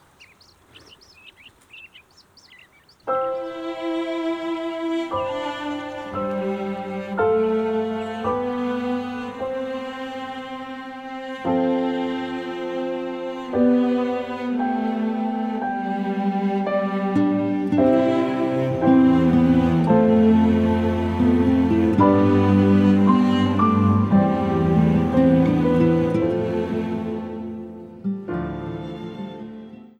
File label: Canon